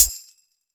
Huge Hi Hat D#, pedal hi-hat sound tuned to the D# note. Loudest frequency: 8372Hz; hat samples